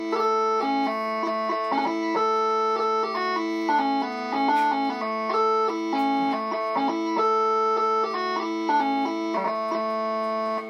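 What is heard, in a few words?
bagpipe tunes; new years & robbie burns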